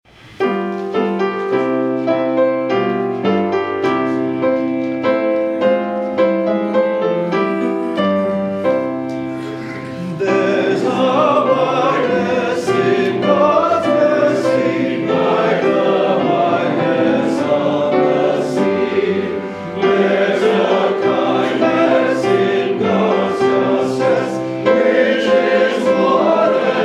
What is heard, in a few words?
hymn; There's a Wideness in God's Mercy